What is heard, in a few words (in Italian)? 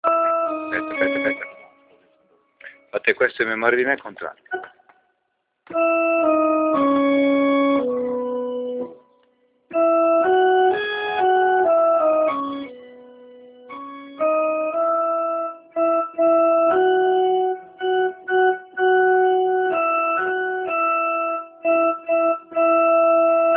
contralti base musicale